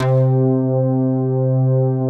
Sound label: ANA PADS; Pro-Rec - Synthsonic; JV ANALOG1